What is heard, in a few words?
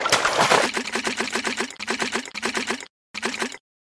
water wood Drop Into